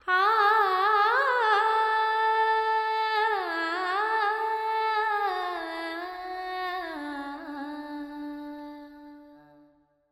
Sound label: Verb 4-4 D 70, Vocals Female; Sample Banks